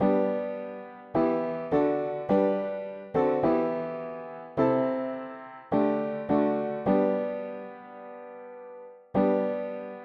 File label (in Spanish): mono piano; hymns; Himnario pequeno